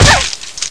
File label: headshot